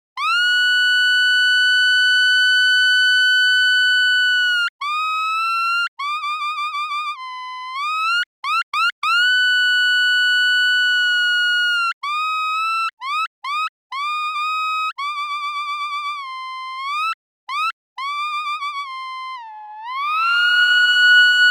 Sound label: seagull record